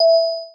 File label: Item Reward; Live Realm